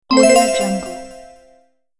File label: bonus